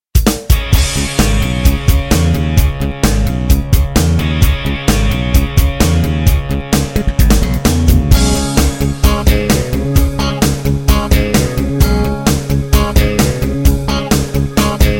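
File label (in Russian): otlichnaya rok muzika, rock music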